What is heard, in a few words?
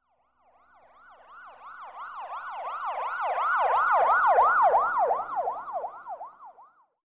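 police car with siren passes by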